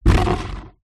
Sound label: industrial plastic bucket kick slide; zapsplat